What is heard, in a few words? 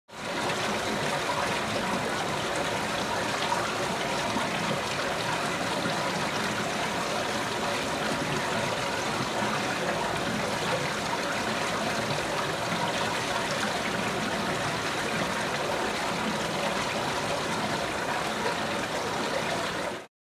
Winter Brook